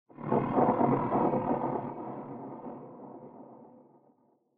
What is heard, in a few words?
Horror Accent Low Short